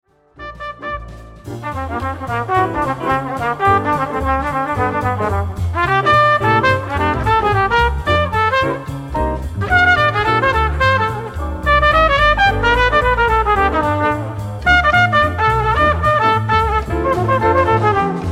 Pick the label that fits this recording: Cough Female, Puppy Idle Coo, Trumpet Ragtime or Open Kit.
Trumpet Ragtime